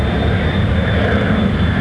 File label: Take Off; Working Model Program